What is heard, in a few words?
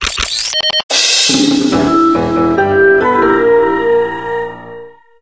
quest status tick advanced complete